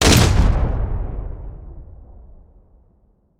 Rifle Shot Artificial With Shutter Clicks Massive Tail